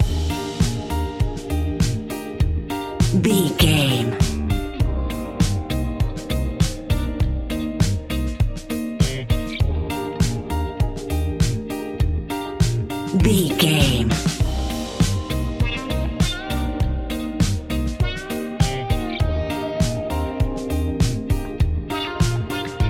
strutting duck, A fast paced piece of electronic dance music